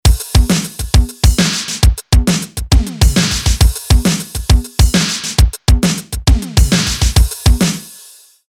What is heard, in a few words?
Dynachord ADD